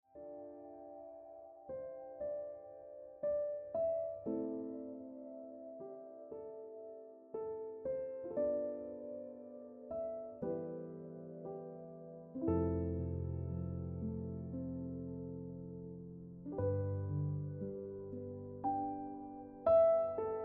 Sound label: Ringtone Piano Music